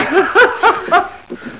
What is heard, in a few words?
monk laugh, monkey